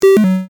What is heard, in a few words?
Wrong